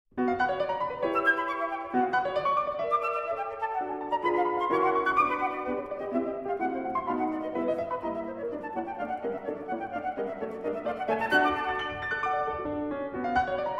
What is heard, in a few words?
Sonata For Flute and Piano, Intermezzo Allegretto Vivace; Undine Op. 167